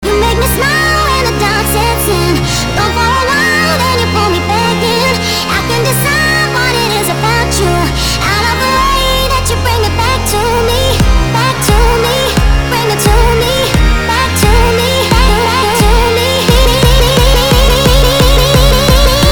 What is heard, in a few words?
Drum Bass